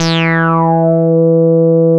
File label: E4, Bass; CRANDLE; E-MU Producer Series Vol. 6 – Analogia Project (CD 1); Boom da Bass 1